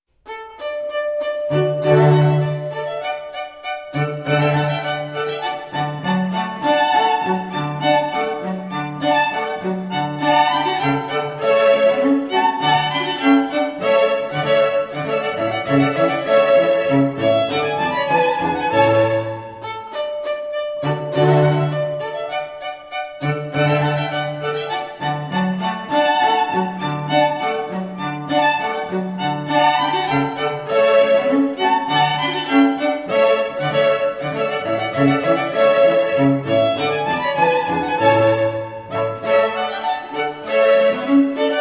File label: for String Quartet; cello; Fine Arts Ensemble